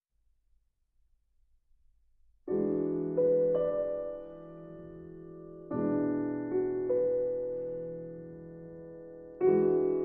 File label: Sonata for violin and piano